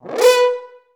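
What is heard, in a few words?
french horn B3; Best Service; Peter Siedlaczek's Advanced Orchestra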